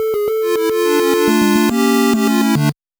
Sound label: gameover; panicpumpkin